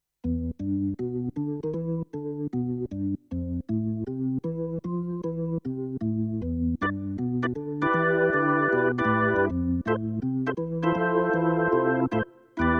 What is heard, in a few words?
S51 Organ